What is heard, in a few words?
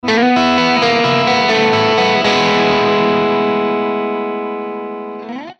Arp